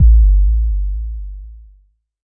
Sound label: Jomox MBase, drum machine; Jomox MBase 01